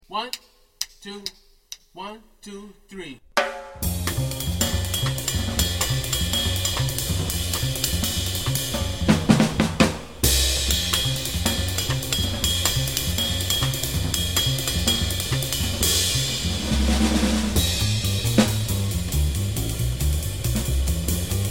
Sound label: bass drums only